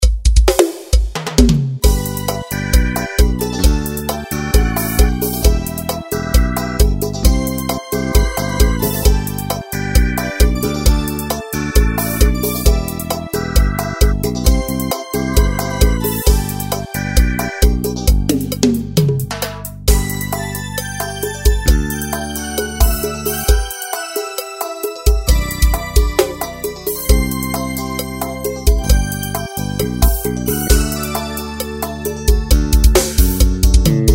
arrocha romantico; kit2015micailproducer

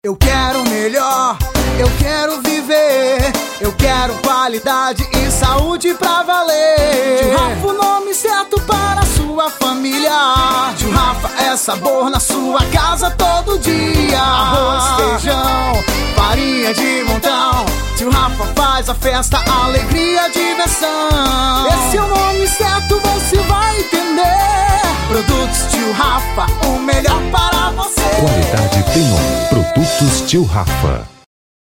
jingle; cerealista tio rafa

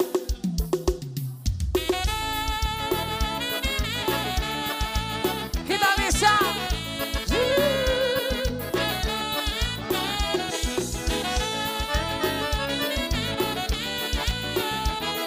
cantora

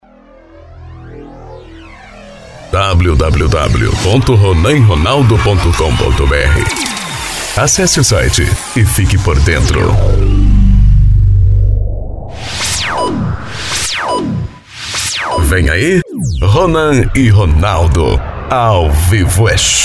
abertura

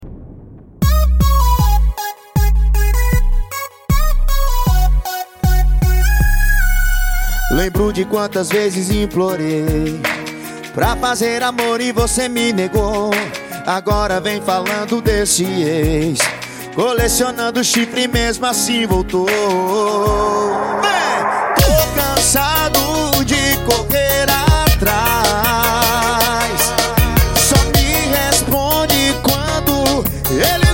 piseiro

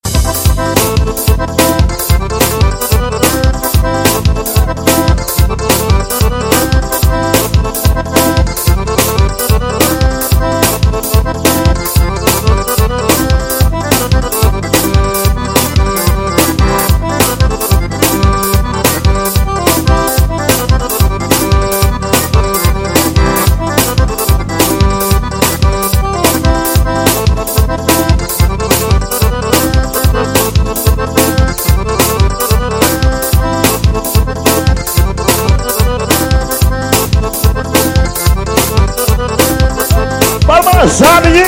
forro solado, forro; aldeia planaltino

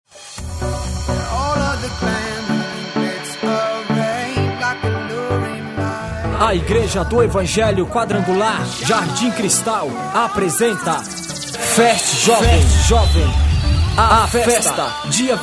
vinheta fest jovem

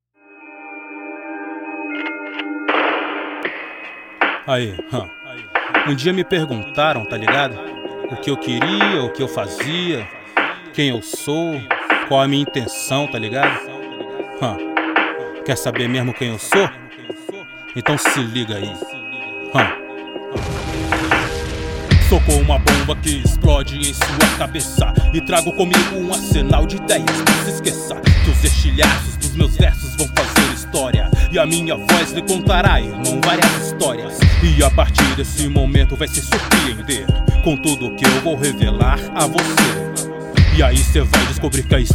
intro vocal sem mixar

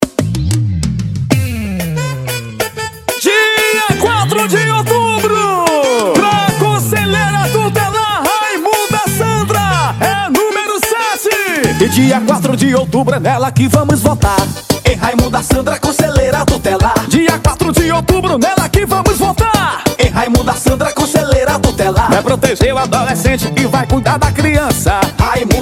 jingle conselho tutelar

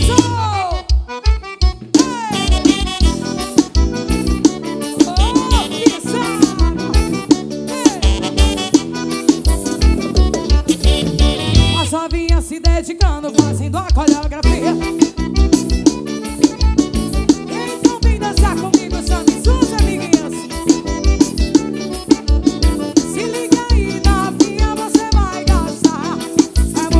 pisada forrozeira; promocional fevereiro

